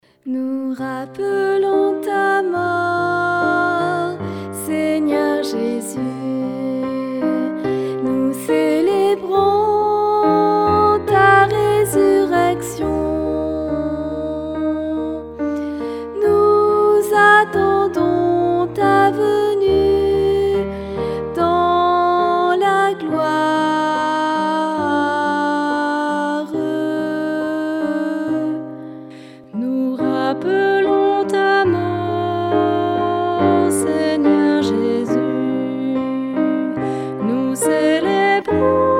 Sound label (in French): Anamnese, Voix; Messe De L Agnus